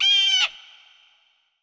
Desert bird; ImpGal2; colony

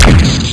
Hit elec; PC Gamer IT CD 48; Big bang

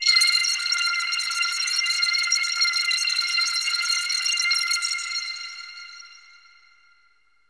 AMBNT 160; eJay; Ambient

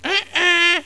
wrong

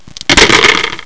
dice; CASINO

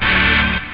mental, rave

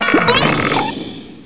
weird

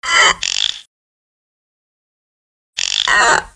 candle

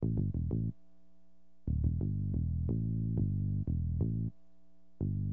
BASS, HipHop; LiveAct Pool

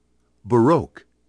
BAROQUE; National Museum of American Art

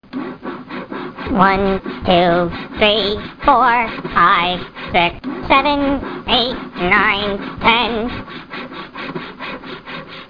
Bug sawing mixed; How Many Bugs in a Box

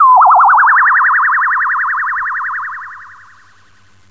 bird tweet; gmtones

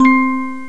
Music Box, Chromatic Percussion; GMPlay

